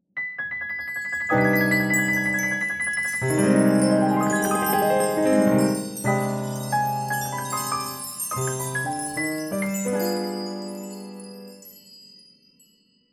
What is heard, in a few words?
piano chimes taps